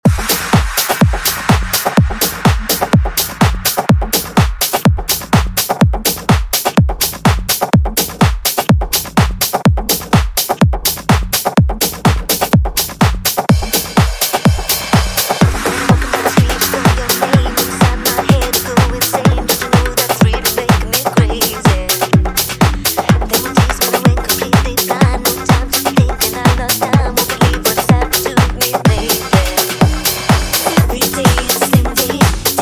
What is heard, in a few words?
Club House ReMix 125Bpm; remixplanet